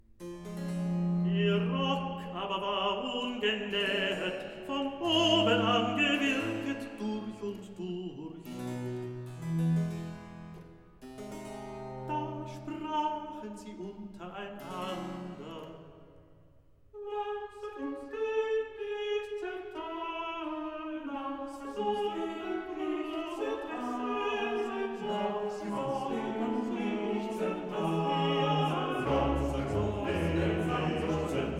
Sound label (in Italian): Recitativo, Coro; evangelist Der Rock aber war ungenäht; Lasset uns den nicht zerteilen; Klassika; Handel Masterworks